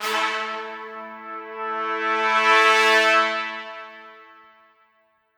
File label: Trumpets cresc; crescendo